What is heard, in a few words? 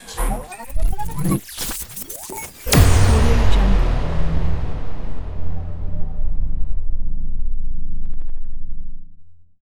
sinematic neon designed leviathan; soundmorph